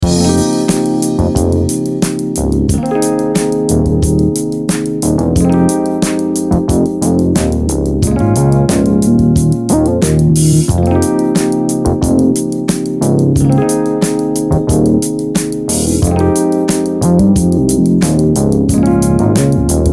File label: Pool Bass